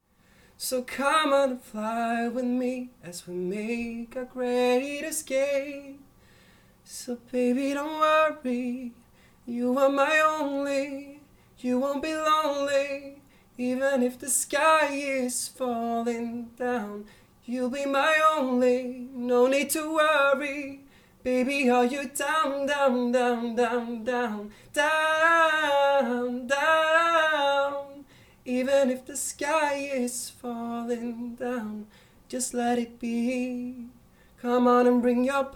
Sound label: acapella